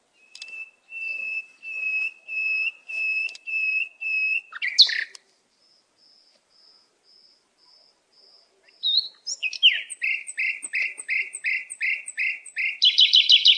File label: techno sounding bird calls; Sound FX Real Life